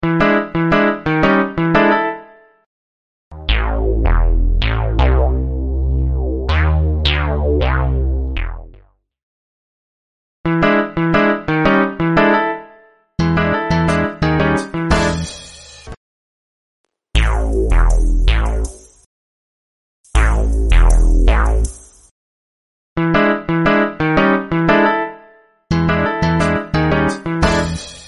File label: alarm sound ringtone